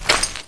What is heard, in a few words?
player mud